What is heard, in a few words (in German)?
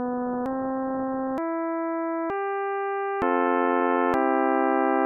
c akkorde